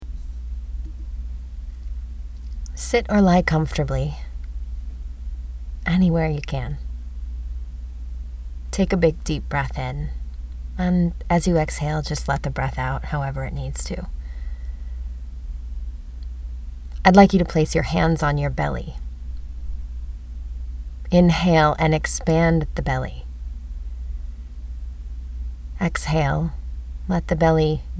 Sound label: belly breathing